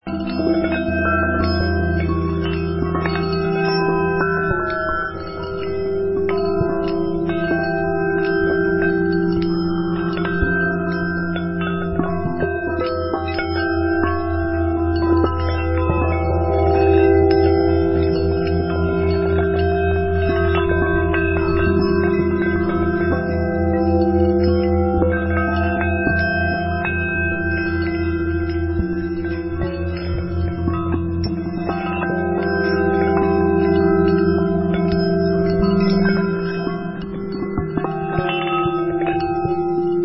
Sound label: Gamelan